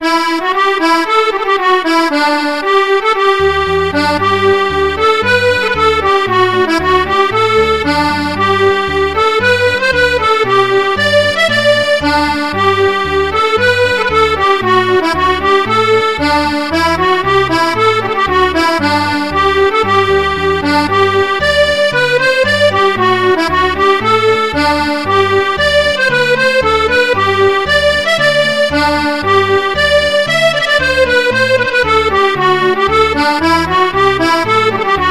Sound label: Traditional Scottish Accordion Strings; Strasser; Akkordica; Bassesland; Syntheway